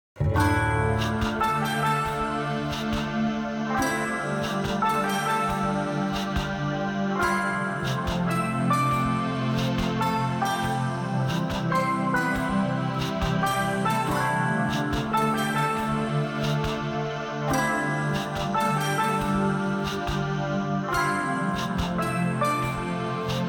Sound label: Rhythm Village Add Layer with Harm; Cave Music; Mountain